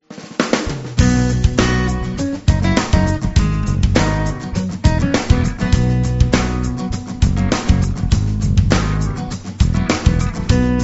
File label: gambling bgm